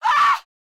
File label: woman injured scream